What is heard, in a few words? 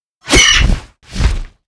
Garuda Attack